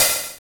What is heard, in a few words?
LINN, Linn LM-1; Sound & Vision - Gigapack I